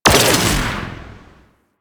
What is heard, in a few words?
Space Fighter Ship Turret Fire B; Warframe; Corpus